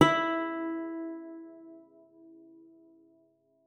E4, guitar; Xsample VOL-2

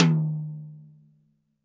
TD-20 Tom A, Roland TD-20 Toms

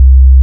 Bass Note C

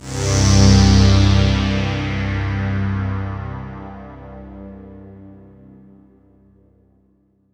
Roland JV 2080, interval; MG INTERVAL

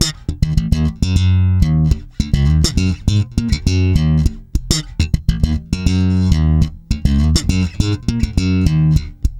D#, hard funk, bass; Spectrasonics; Bass Legends

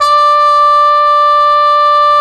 BRS CPM TP, Cup Mute Dry

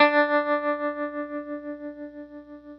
GRTCH TREM D3; Sonic Reality; American Heartland

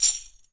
TAM, NYC Percussionworks

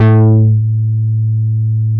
DETUNE BASS, VINTAGE VOLTAGE; Masterbits - Vintage Voltage; VV BASSES

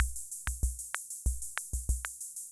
Ace Tone Rhythm Ace Loop, Drum Machines; 1967

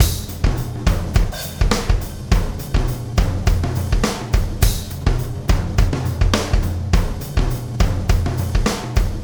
Wormhole Beat, Processed Drumkits

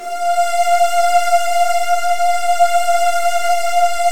F2, Virtuoso Strings; ILIO; Kirk Hunter Virtuoso Strings VOL-1

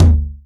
202 tom, Drums, Boss DR-202

